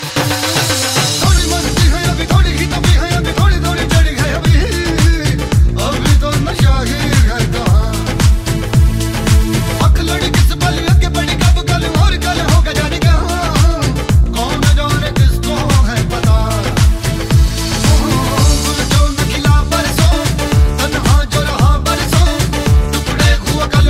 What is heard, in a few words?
latest music ringtone, bollywood ringtones